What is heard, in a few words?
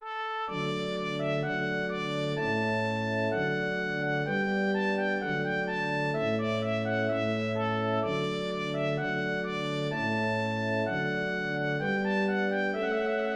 Marsche en Rondeau